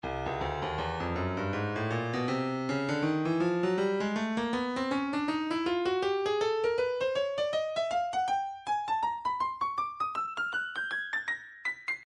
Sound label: breakbeat midi files 080 084 bpm; Stylus